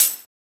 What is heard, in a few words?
Open Hat; FLPRO